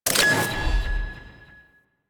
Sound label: Hub Concourse Deploy Switch; Warframe; Ambience; Tenno Hub Concourse Fragment